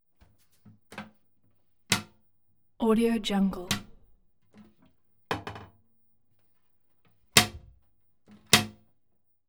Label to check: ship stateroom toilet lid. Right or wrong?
Right